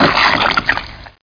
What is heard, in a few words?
gibs